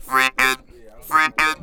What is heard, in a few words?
FREAKIN, Talk Box